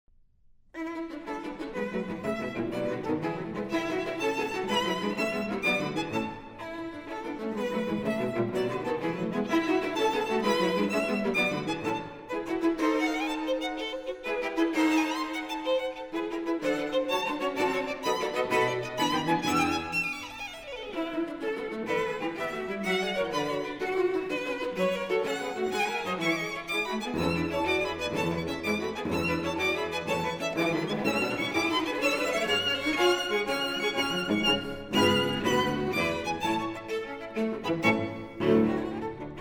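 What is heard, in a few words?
string quartet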